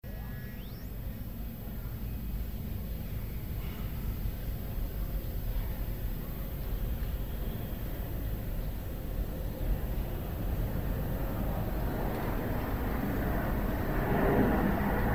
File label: Sketch Instrumental